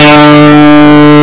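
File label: 60s Rock Guitar, Heavy overdrive guitar D3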